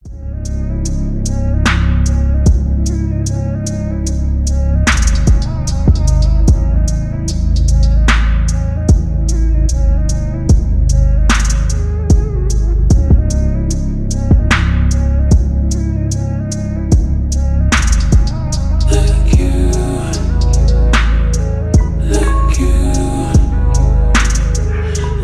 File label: slowed reverb